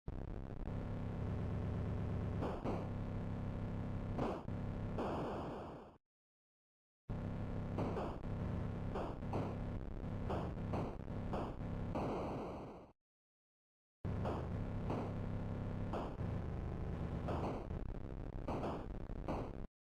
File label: Combat game Atari; Zomboid; Video Game Consoles